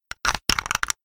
household torch flashlight maglite battery compartment lid screw on or off; zapsplat